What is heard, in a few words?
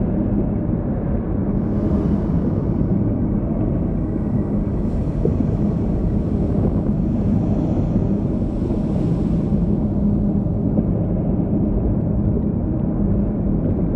neutron star idle hum; ambient; Stellaris